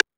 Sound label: Techno H; EMU XL-7